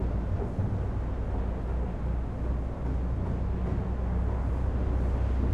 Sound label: Amb Capsule